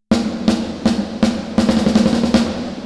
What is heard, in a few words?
Chorus High Detune Thicken Chorus; Wander 50.0ms